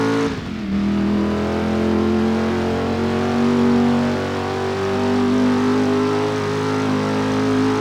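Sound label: fourth cruise, car engine; sgmcars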